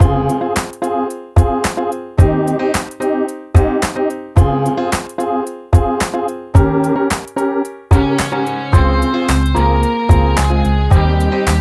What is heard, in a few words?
Minor Conversation; YIIK A Post-Modern RPG